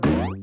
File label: cloud bounce; Teen Titans Go! - Raven's Rainbow Dreams